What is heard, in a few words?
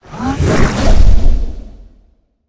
fire; Smite; Zhong Kui